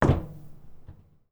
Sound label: metal, footsteps; grunk